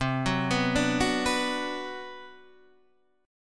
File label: B Minor C; Bm/C arpeggiated; JGuitar